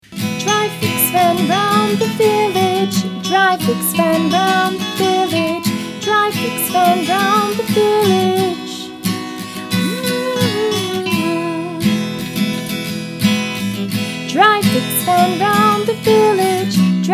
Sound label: V song, phonics